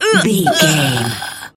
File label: voice female death